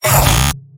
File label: engineer pain sharp; tf2; mvm